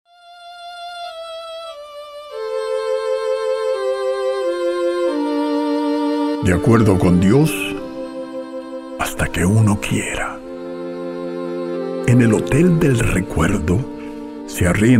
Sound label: VIOLIN; FESTIVEGAS 2017 EXTRA MIX